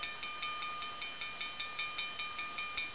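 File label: train bell; 12000 Graphics Pack; Media Graphics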